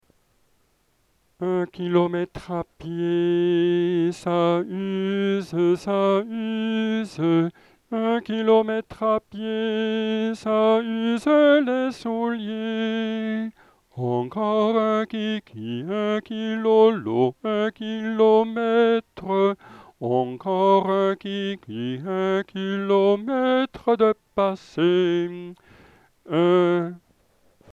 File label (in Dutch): frans lied un kilometr a pied zang eenstemmig